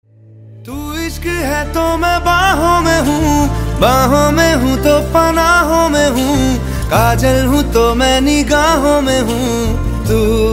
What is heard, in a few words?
Ringtone